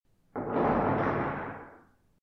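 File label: tsumiki, building blocks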